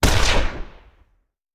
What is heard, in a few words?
Rifle; Sound Ideas; Guns